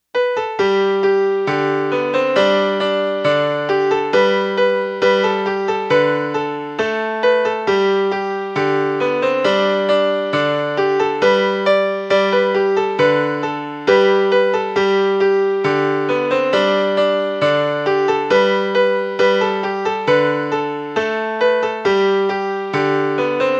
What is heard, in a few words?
Turkey in G melody